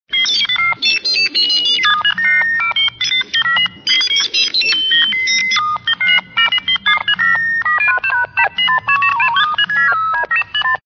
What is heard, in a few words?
Computer thinking